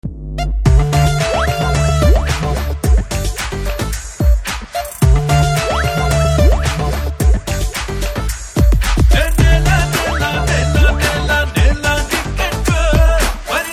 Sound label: Ringtone